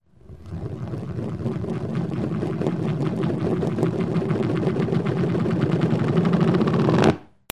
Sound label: FALLING